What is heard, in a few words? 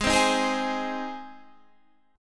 G sharp Major G sharp, chord; strummed